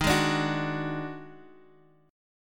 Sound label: Eb Minor Major 7th Double Flat 5th, Guitar, Half Step Down